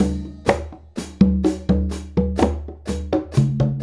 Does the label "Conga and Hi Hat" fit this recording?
Yes